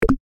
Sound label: turn off click; minecraft